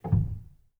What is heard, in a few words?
rel, Grand Piano; bitKlavier Grand; Piano Bar